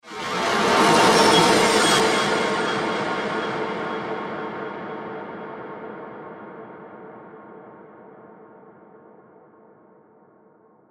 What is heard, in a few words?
Fast Moving UFO Transition; Sound Design